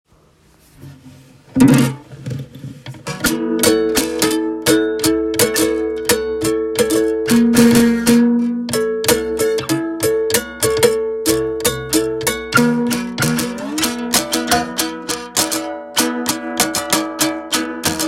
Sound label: Guitar